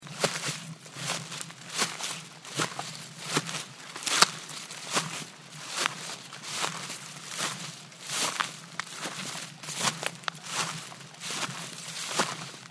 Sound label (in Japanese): 草の上を歩く音